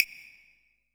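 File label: castanet, JV-1080 drums; EdgeSounds; Drum Mashines VOL-1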